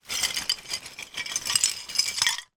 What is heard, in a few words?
foley broken dish pieces tip out of bag onto carpet; zapsplat